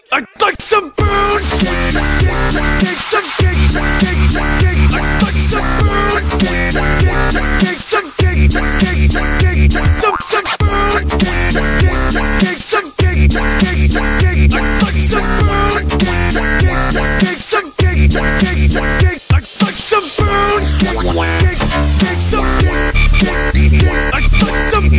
Dubstep

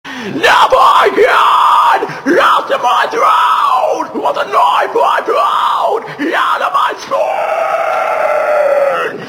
toxic screamo; rock

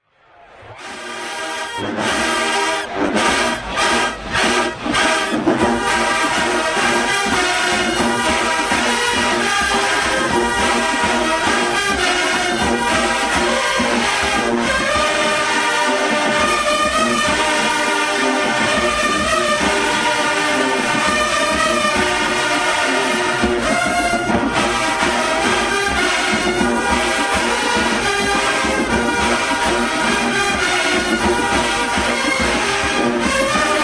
marching band; southern university

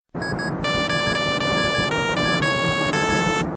Gameboy Horror

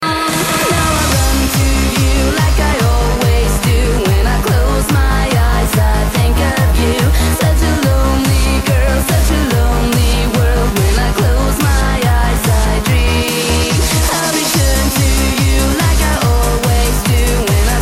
trance; quake

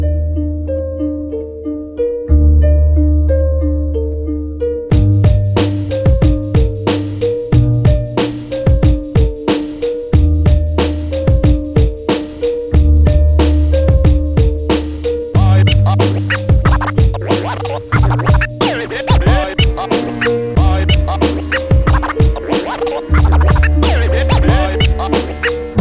Scratch Hook

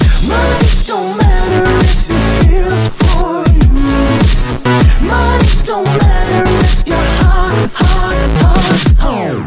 Turntable

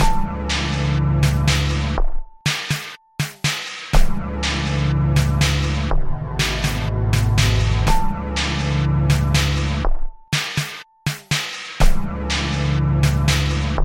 Chill ring tone

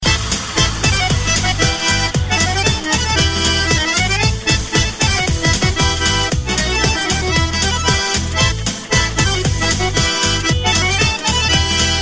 chechen Pondar

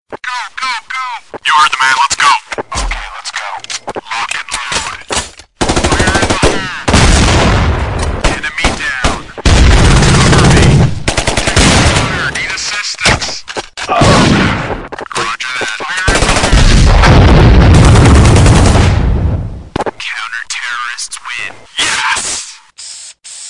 army msg

